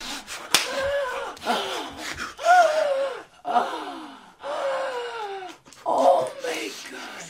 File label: uhhhhhhhhhhh